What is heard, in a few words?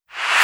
VFX Short Fills & Reverse